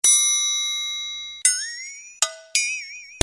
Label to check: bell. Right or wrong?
Right